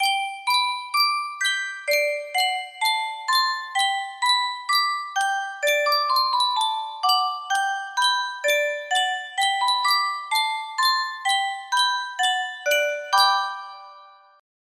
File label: sankyo music box, melody